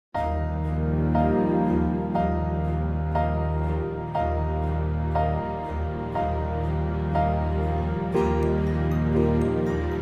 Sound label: orchestral; key - Eb - vocal range - C to Ab (huge vocal range)